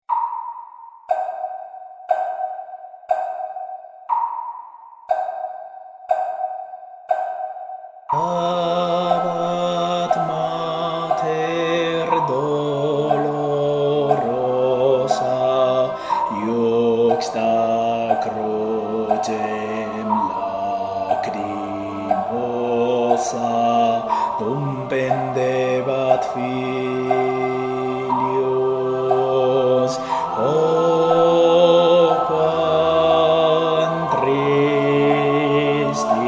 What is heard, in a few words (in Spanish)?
El santo entierro BAJO